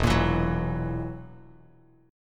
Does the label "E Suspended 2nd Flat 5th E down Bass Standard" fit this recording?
Yes